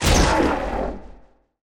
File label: Burning Lava Golem damage